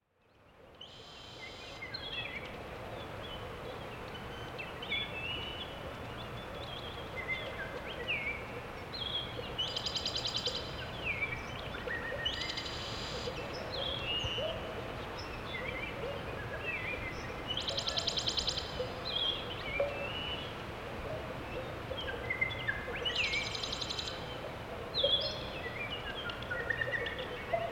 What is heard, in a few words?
Birds